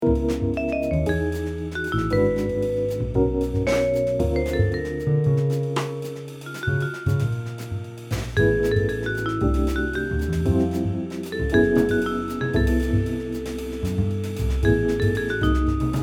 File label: fusion